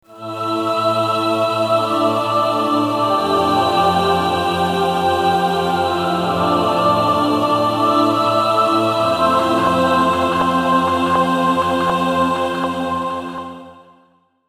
Chor Stretch

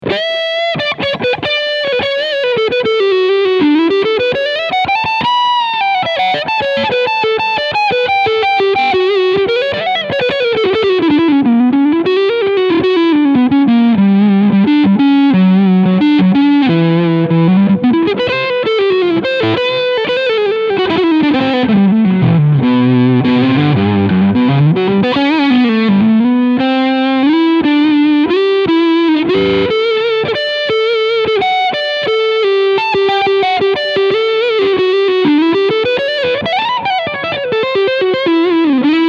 Harley Benton TE 20MN E-Gitarre Overdrive Neck Pickup

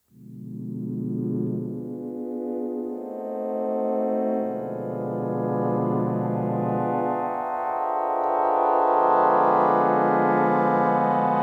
Diverse Sounds

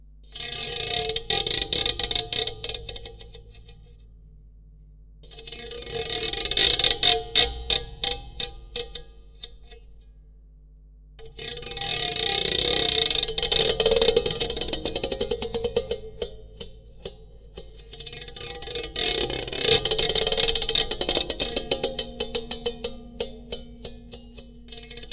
Soundbox Mk2 Federn schaben 1 Pickup

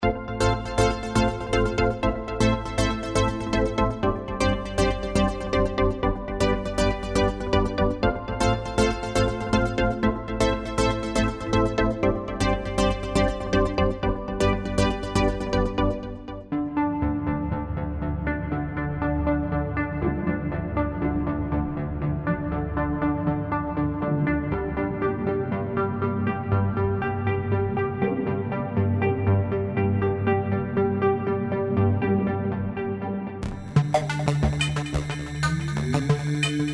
pads und co

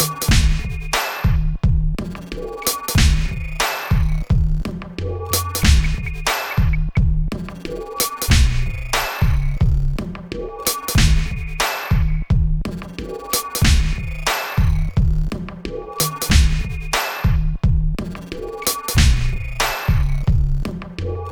TC144 mit AQVOX

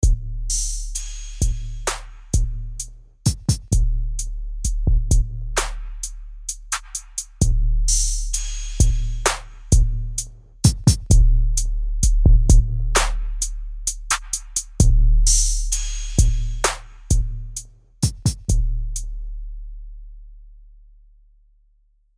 Master Sättigung

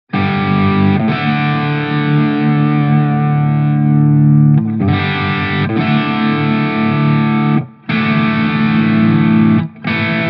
EL34 Crunch Kanal Boost; Blackstar St. James 50; XLR

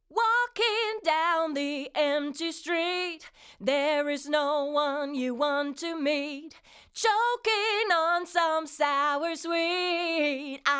AMS Neve 33609N Vocal 6 zu 1 Starke Kompression mit Limiter max. Reduktion 8 dB

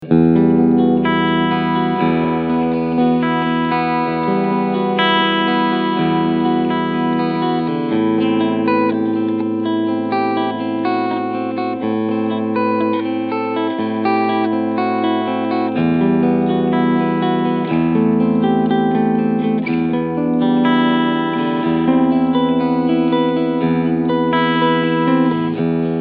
Solar Guitars SB1.6 Clean Sound Steg Humbucker SC Modus

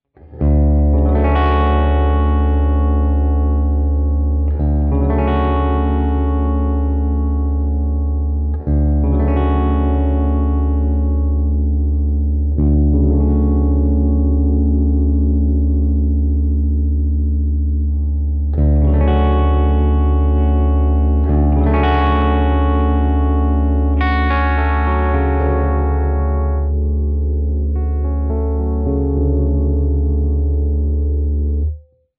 clean bridge Tone Poti; AZlean

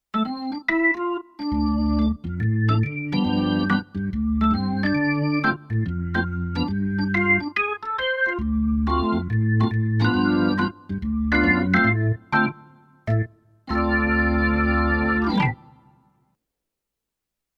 Roland BK3 Orgel

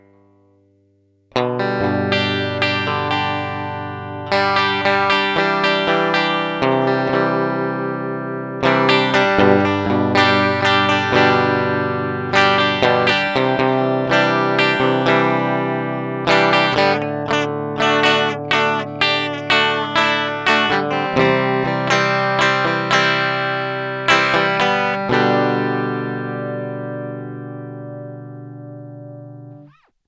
Fender Tele Pur; Antelope ZENQ

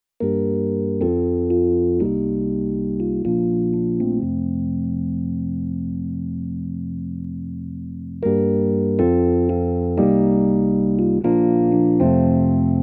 Chorus ohne Modulation Rhodes

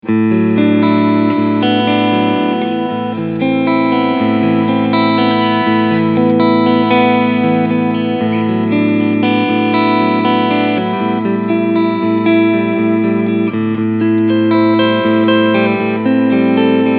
Schecter C-1 Apocalypse E-Gitarre Cleansound Front PU HB Modus; Red Reign

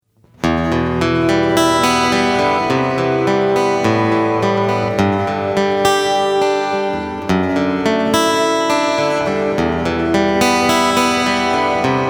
Hall

